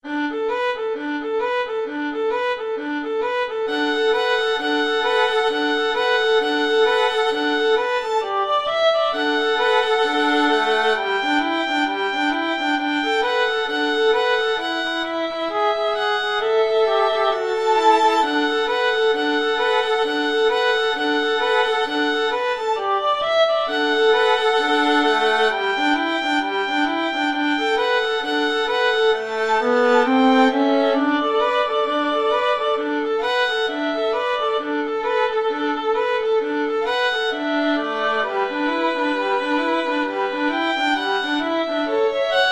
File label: jingle bells boogie, violin duet